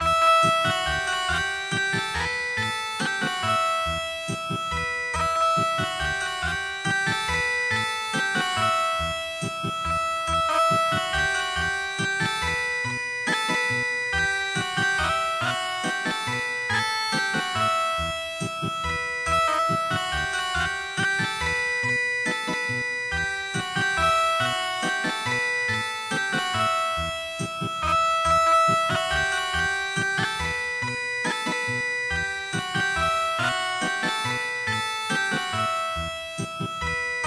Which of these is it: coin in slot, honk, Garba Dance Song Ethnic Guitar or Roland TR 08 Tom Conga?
Garba Dance Song Ethnic Guitar